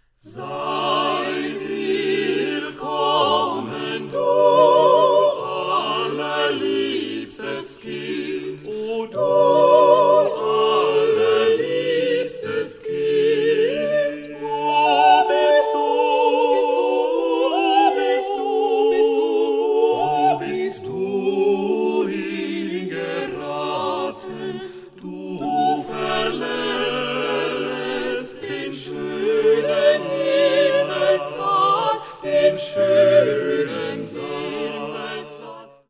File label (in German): Sei willkommen allerliebstes Kind deutsch